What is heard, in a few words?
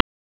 silence 8kHz; aws-sdk-java-v2; transcribestreaming